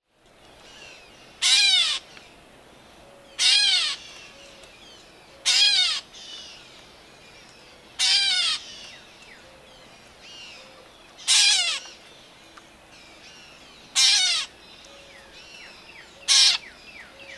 Grey Catbird Dumetella carolinensis

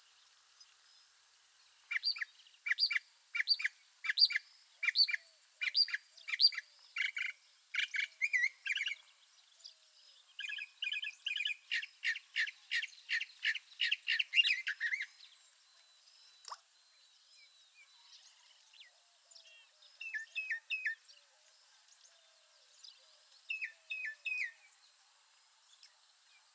Northern Mockingbird Mimus polyglottos

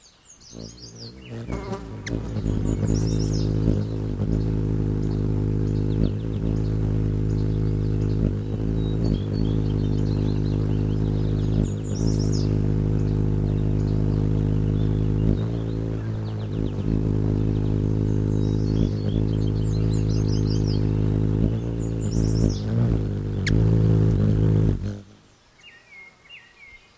Ruby throated Hummingbird Archilochus colubris

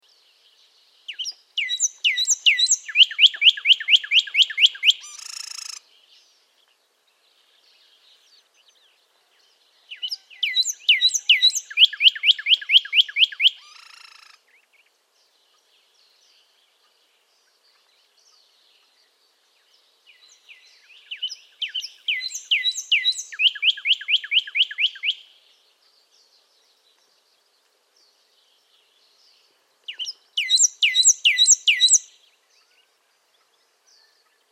Northern Cardinal Cardinalis cardinalis superbus

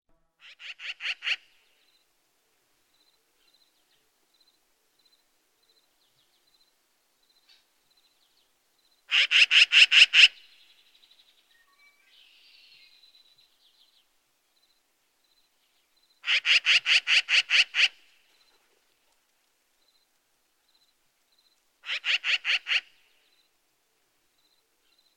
Black billed Magpie Pica hudsonia

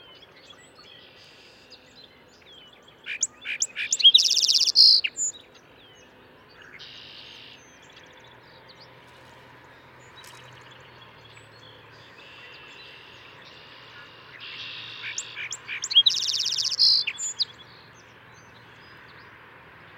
Song Sparrow Melospiza melodia

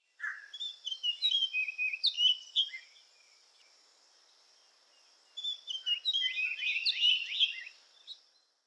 Orchard Oriole Icterus spurius

